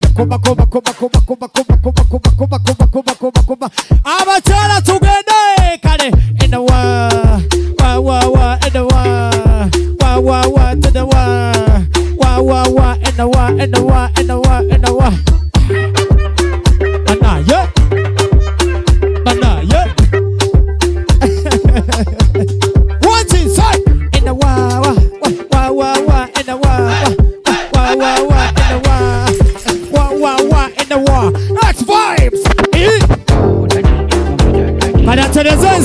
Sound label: Vocal